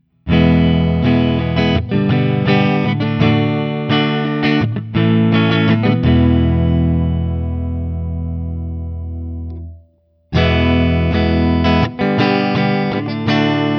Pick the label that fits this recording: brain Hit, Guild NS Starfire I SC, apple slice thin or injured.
Guild NS Starfire I SC